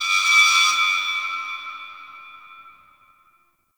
Fortress Alarm Texture; Ambience; Warframe; Grineer Fortress

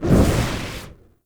Sticky Bomb Proj; Grineer; Veilbreaker

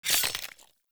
Grunt Death Gore Medium; Sinecure; Melee Grunt; Enemy; Death Effects

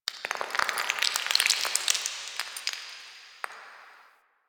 Stone Debris; Ambience; Warframe; The New War; Quests; Zariman

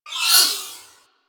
Swarm Cannon Projectile By; Warframe; Weapons; Corpus

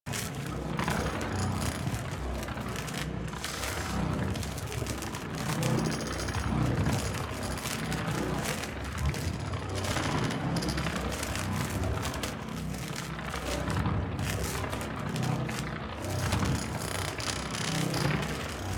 Overcharged Loop; DE; Warframe; Warframes; Gyre

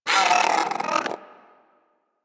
Hyena Micro Vocal Pain; Warframe

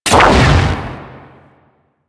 Sapient Pistol Explosive Impact; Warframe

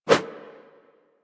Throwing Dagger Idle Light Whoosh; Warframe; Weapons

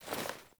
Foley Action Melee Swipe, Default Clothing

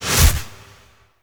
Melee Damage Multiplier, Whooshes; Warframe; Weapons

